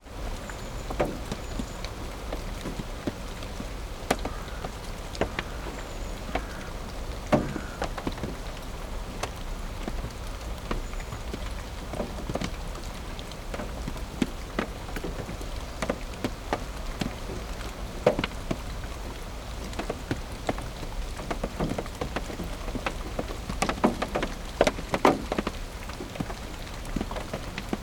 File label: nature rain wind recorded inside car windows open drips on roof